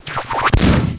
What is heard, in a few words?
p drunk, weapons; predator